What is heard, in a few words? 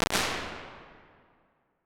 2.0s Cellar Large, Impulse Responses; Medium Spaces; Indoor Spaces; Apple